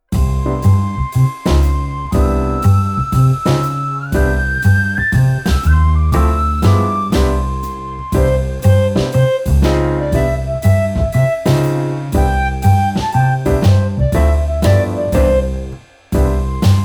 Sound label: The Farmer in the Dell Instrumental